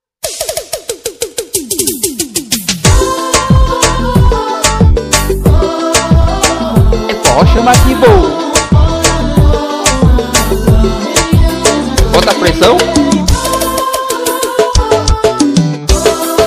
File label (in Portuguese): forró